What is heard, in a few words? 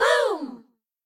rah Rah Sis Boom Ba Boom girls; cheer Readers